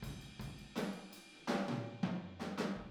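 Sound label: Boogaloo Fill Room stereo, 82 BPM; Sonic Foundry; Drums from the Big Room The Mixes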